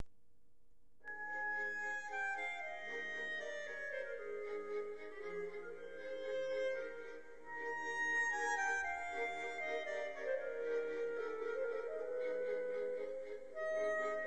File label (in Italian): Clarinetto